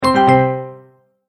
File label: multimedia tone, Goodbye; Tones; Sound Design; McKinney Sound